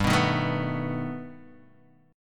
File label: G Augmented Add 9th, chord; G+add9 Chord {3 2 1 2 0 X} strummed